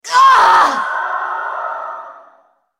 dying; BlackIceAnivia

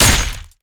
basicattack human; LoL; shyvana

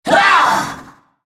spellcast effort; FizzSkin04

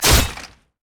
basic attack sword; rengar

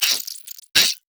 voidling death; LoL; alzahar

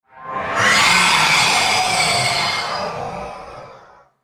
ultimate effort; CyberNocturne

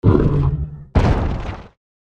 ancient golem dying

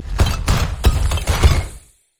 rock; lissandra skin01

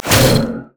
frost flamebreath; LoL; shyvana

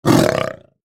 cougar effort; Nidalee; Character Voices; English

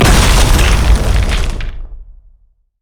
ring of frost; LoL; lissandra

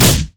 monkeyking nimbus kick; LoL

